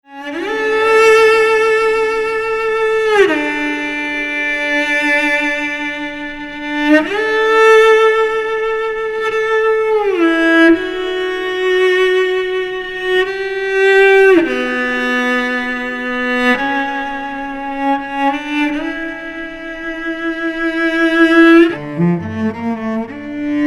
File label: Classical Medley